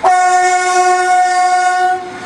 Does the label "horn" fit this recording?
Yes